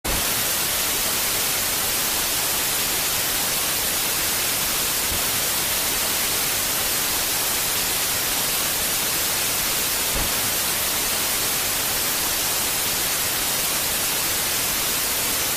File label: bright noise